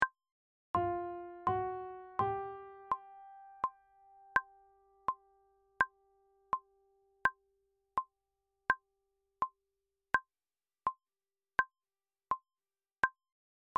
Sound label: cuban 80 tempo oboe and baritone